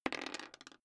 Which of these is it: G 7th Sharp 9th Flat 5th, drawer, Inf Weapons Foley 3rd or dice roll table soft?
dice roll table soft